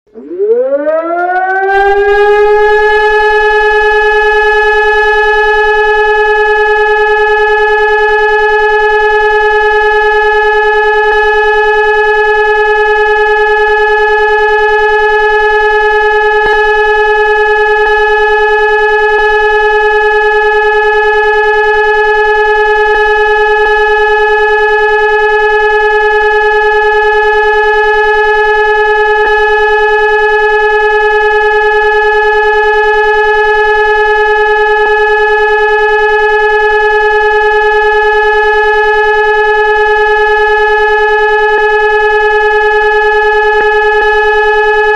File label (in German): sirenen ton entwarnung